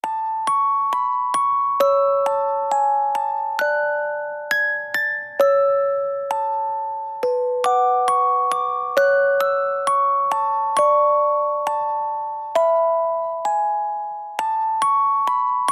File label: Rainbow Magic MusicBox